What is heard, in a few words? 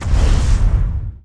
hard hit; RYL 2